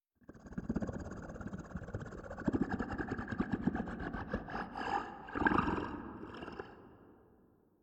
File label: Creature; we are the dwarves gamerip 2016